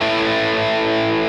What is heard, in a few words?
Arp